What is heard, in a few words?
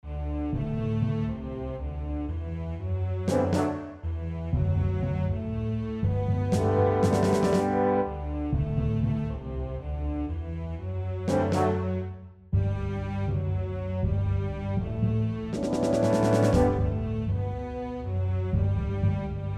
melody A heavy